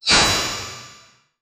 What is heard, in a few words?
futuristic cyberpunk deep